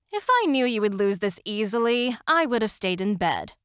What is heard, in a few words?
Death; Yukari; Phantastical Boundaries